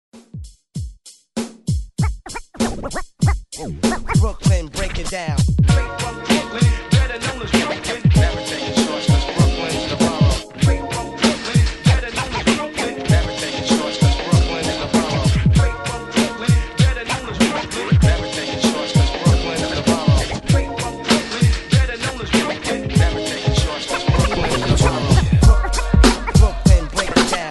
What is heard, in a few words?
90s hype redrum megamix; DJDOLLS